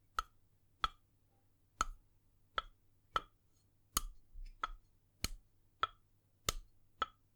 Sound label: Touch Of Glass